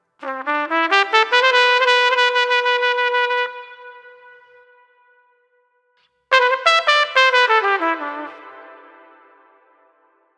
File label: Trumpet Solo; труба